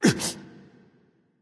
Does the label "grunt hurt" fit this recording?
Yes